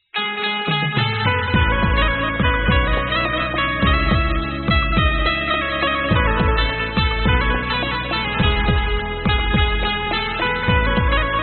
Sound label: Instrumental